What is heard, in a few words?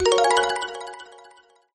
Jingle Start Game